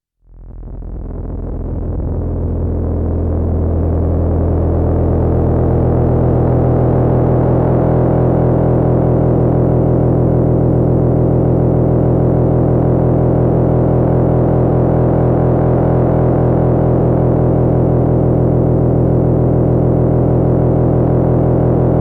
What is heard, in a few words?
MS-20 Deep Inside the Engine Drone